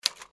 typewriter

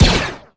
first order blaster

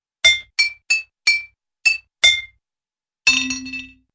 musical coin bounce

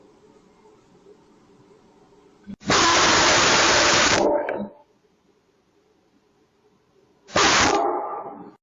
dog breaks sound barrier

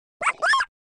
breadbug death

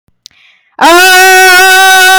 loud

